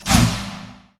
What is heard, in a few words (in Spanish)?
sfx vodoo ataque